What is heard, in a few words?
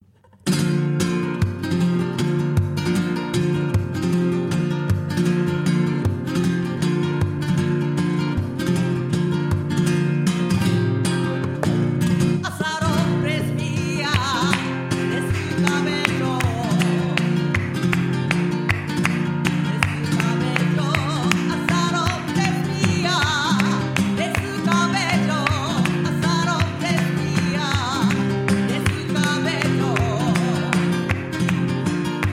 sevillanas R160, flamenco sounds